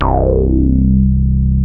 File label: REZ, TWAV BASS; Soundengine - CSR-000 The Art of Transwaves